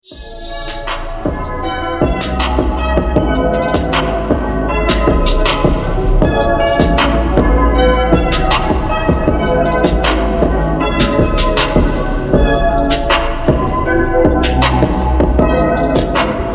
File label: intro instrumental